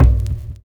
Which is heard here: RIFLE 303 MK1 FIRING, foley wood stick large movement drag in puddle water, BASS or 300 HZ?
300 HZ